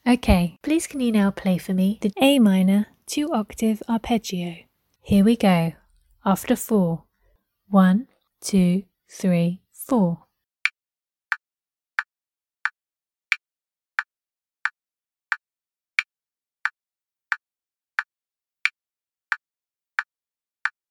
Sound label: a minor 2 oct arp